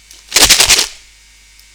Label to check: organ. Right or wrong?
Wrong